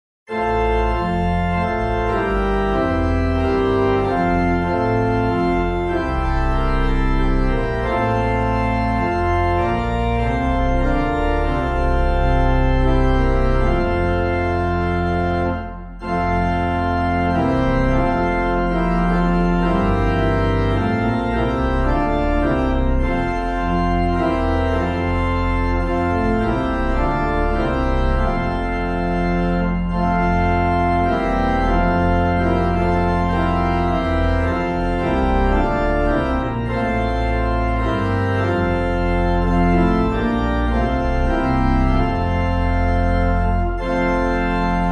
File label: organ, Hymns